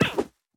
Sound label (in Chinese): 犰狳滚动声